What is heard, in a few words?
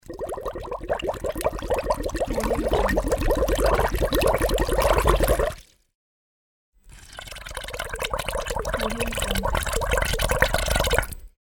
rising bubbles